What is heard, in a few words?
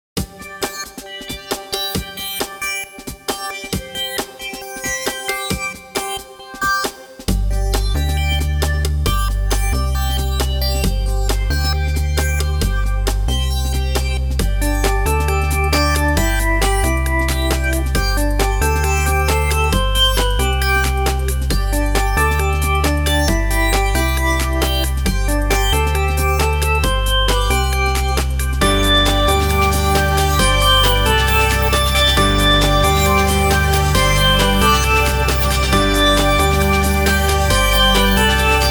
bright electro mini theme